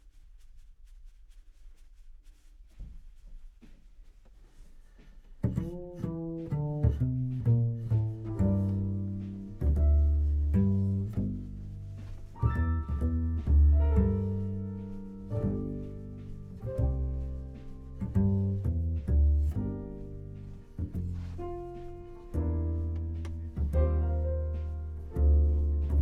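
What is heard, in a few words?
Contrabass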